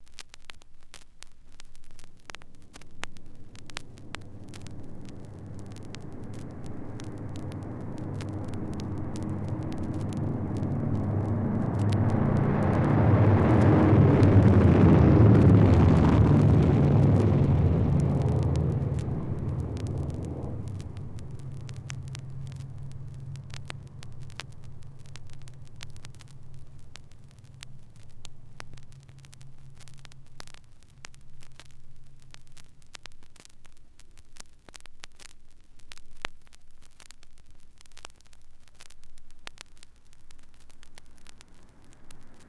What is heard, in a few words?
DC7 Taking Off